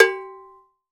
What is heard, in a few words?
Bongo Cowbell, Roland BK-5 Percussion